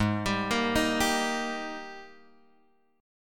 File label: G sharp Major 7th Suspended 2nd; G#M7sus2 Chord {4 X 5 3 4 3} arpeggiated